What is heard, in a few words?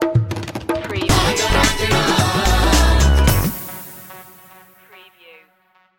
mumbai groove stinger